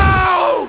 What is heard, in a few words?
owww; planetquake; rocketland